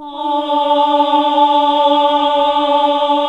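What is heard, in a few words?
AAH D2, choir; Best Service Gigapack 1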